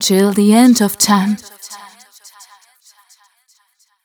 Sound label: f mel c, fem Vocals